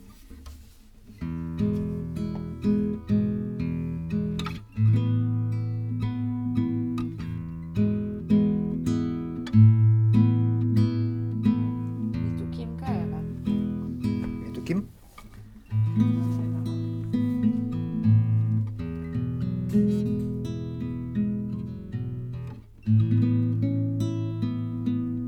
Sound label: Tape GTR